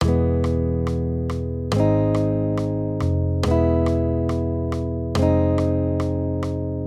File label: Accompaniment, Major Triads, Root Movement Four Semitones, E-Ab 140